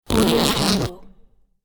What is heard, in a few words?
zip